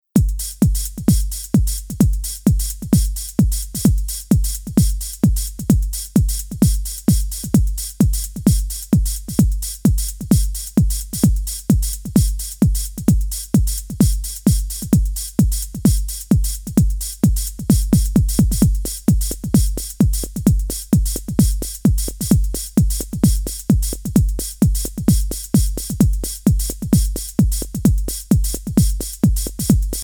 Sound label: only bass